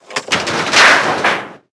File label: metal fence; World of Tanks Blitz